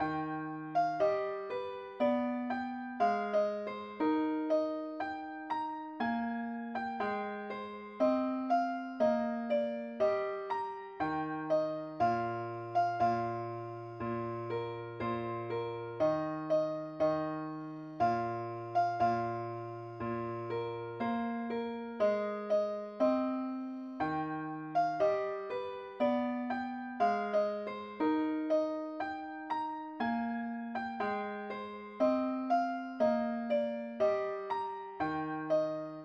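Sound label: mandolin key e flat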